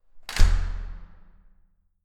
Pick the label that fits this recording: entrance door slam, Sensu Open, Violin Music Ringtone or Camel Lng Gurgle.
entrance door slam